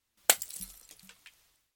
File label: broke glass